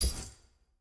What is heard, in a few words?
button cancel click; tft sf2023